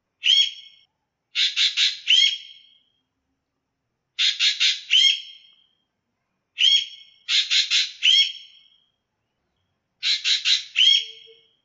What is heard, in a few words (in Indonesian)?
variasi ekek geling